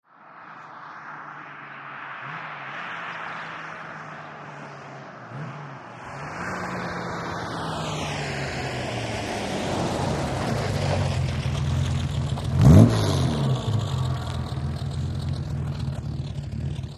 VEHICLES ASTON MARTIN: EXT: Approach, stop, rev, switch off, birds at the top of track.